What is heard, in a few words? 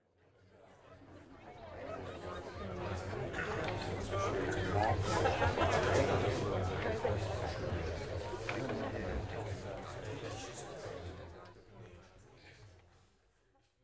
Crowd Murmur